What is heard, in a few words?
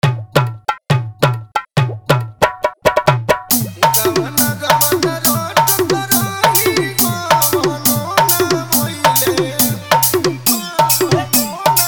New Viral Bhojpuri Dj Song Hard Bass Mix